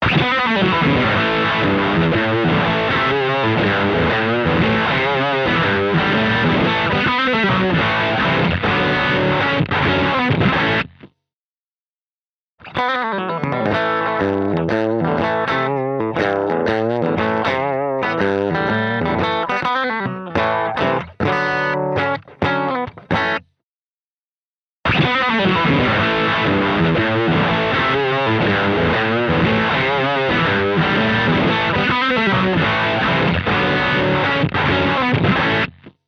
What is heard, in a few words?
H90 Programs Guitar Riff; Rocket